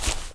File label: Footstep - Soft Sole On Dry Grass; Frogsong